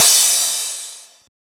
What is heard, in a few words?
Crash